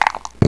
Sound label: DICE; ProBack